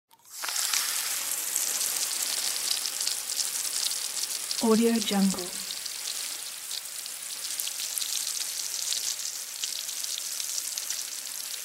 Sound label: spill